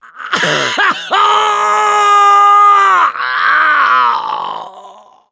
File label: death scream, mechanic, survivor; voice